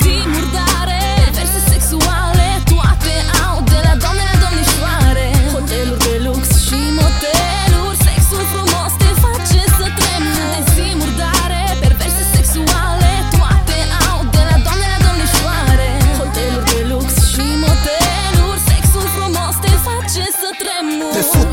Sax; Orc

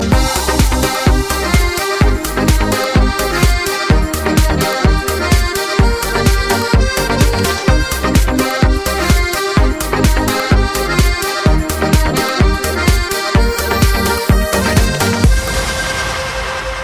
Accordion

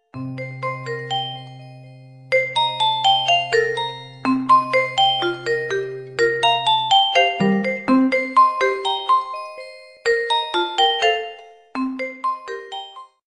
Nokia Brook

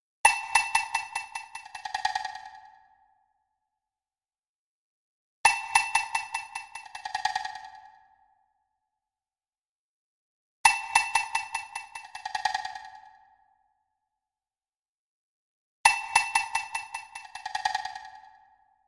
Nokia Sticks

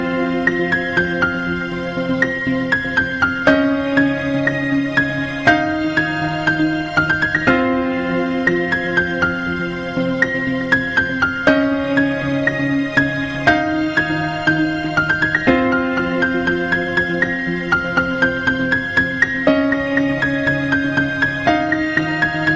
Violin dubstep

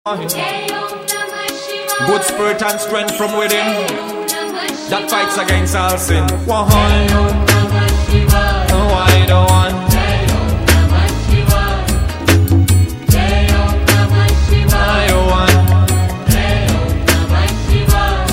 Jai Om Namah Shivay

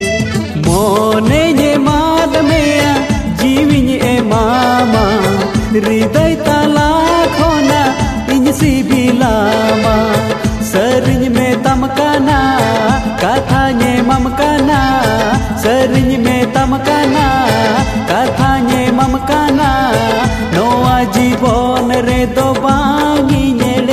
Santhali

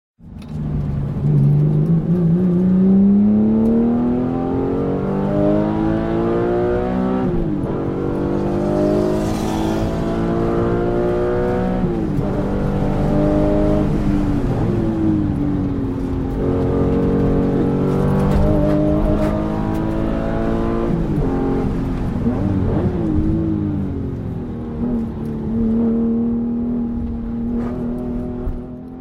Porsche Gt3

